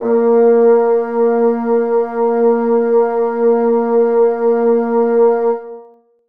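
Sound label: bassoons sustain a#1; Sonatina Symphonic Orchestra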